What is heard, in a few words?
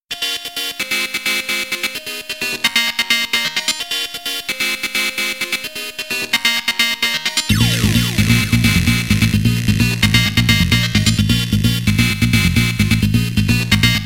Techno Tune